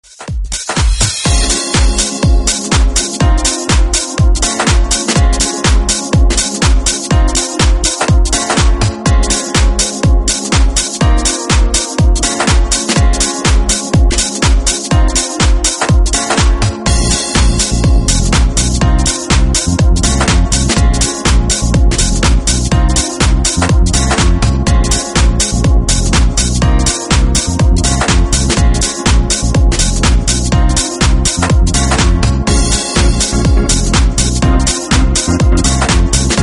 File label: Soulful Instrumental